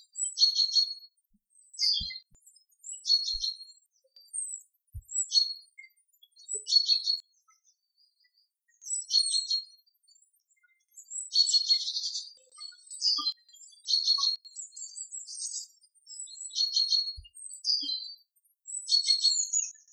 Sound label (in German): voegel; audacity